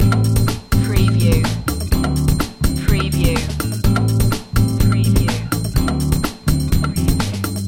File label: latin shake loop